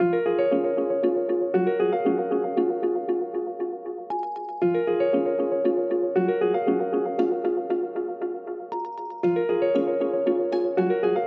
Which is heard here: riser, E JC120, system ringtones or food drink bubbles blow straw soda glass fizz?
system ringtones